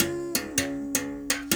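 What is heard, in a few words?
79 bass berimbau phrase; Roland LCDP11 Africa VOL-1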